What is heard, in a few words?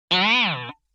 Boing Box